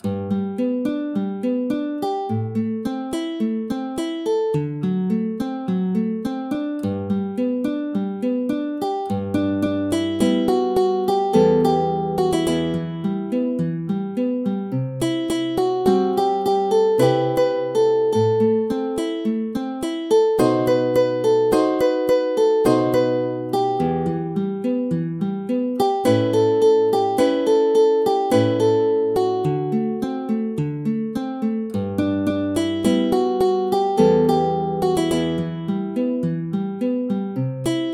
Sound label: synth